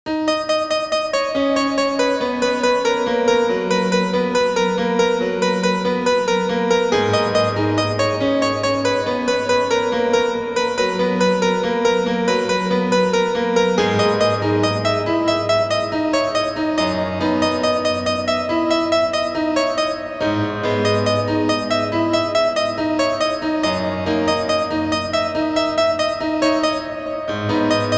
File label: sunsets piano solo melody